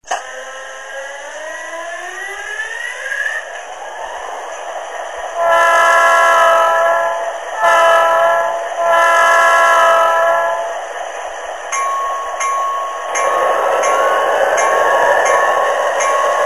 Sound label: emd gp40, loksound